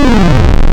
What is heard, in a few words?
Pul Sync, wavetables; Animoog